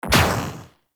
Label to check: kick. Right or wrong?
Wrong